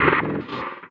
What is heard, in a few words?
One Shot, Hits